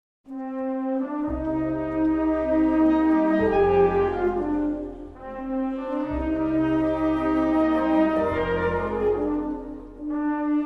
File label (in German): Poco Allegretto aus Sinfonie Nr. 3; Johannes Brahms; Arr. Dietmar Rainer